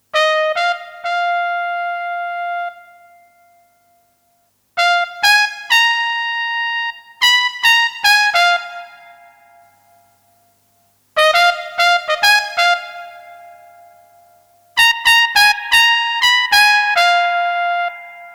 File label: Trumpet; Behringer 646